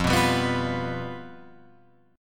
F Suspended 2nd Flat 5th, Guitar, Open F; strummed; JGuitar

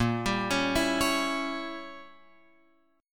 Bb Diminished 7th Bb Guitar Open F; arpeggiated; JGuitar

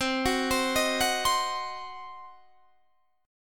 C Diminished

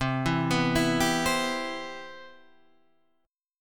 C Major 7th C down

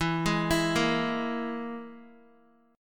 E-Major Flat 5th E, Guitar; arpeggiated

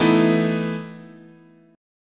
E Major 7th Suspended 4th, chord

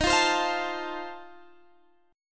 F 7th D sharp

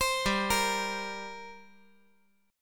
G Minor Double Flat 5th; 5G,4C,5E,5A; chordshape; down

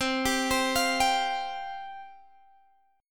C Suspended 4th C Guitar Standard; arpeggiated; x,15,17,17,18,15; capo=2

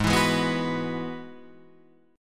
Ab Minor 7th Sharp 5th Ab, Guitar Standard; strummed; JGuitar

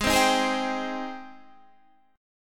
Ab Major 7th Ab down Guitar Standard; AbM7 strummed; JGuitar

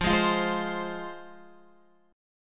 F Minor, down, Guitar; Fm strummed; 4F,4A,5C,5G,5C,6E; JGuitar

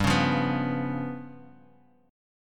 G 7th Flat 5th, Guitar, DADGAD; 5,4,3,4,x,x; down

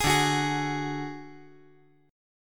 D Major Flat 5th D, chord

